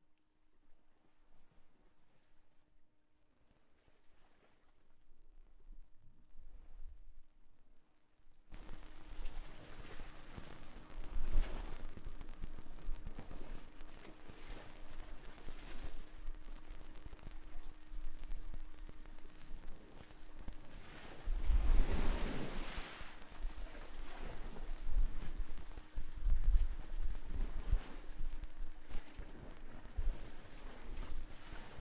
Wellen (Rode NT4); Curacao; Fieldrecordings

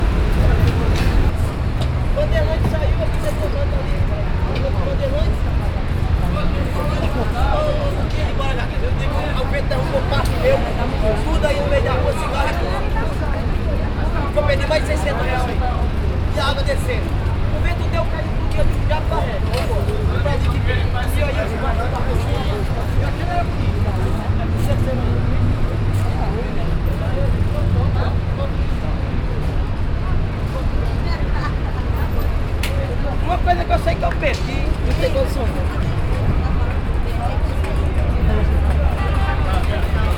aufm Markt, Fieldrecordings Brasilien

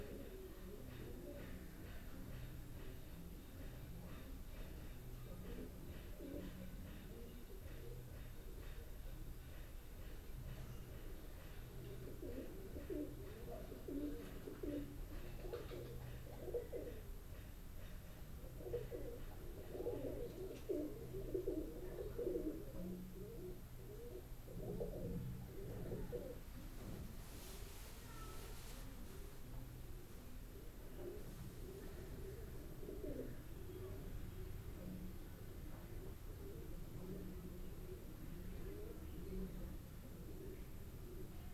Tauben in der Wand; Boudha, Kathmandu, Nepal; Audio Matrix

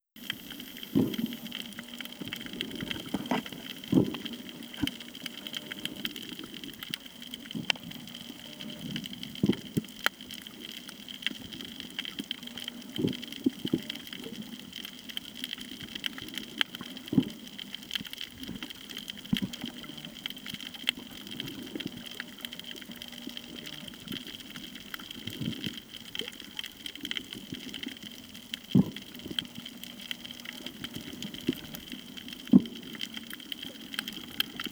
Schnorcheln und Strand; Original Video Ton; Koh Tao; 19. Dezember 2019 - 6. Januar 2020 Bangkok - Koh Tao (Fieldrecording Audio, Foto, Video)

